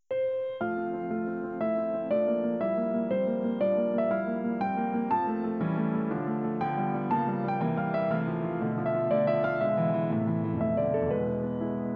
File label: Chopin Nocturne in F Major Op15 no1, piano